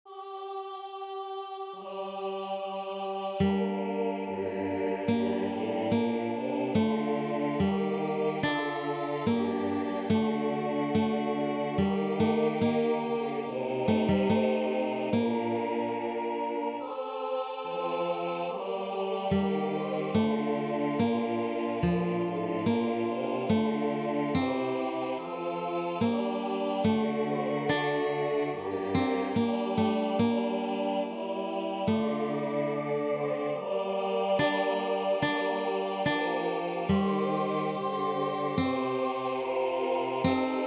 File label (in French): ps ténor; Psaume 23